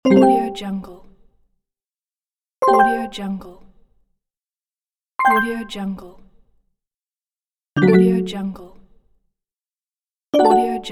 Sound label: points